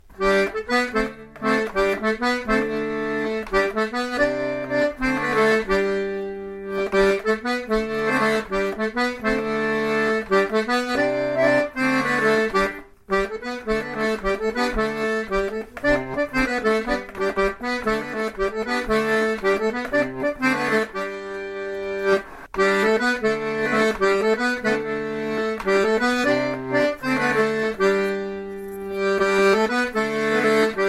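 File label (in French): gavotte, accordéon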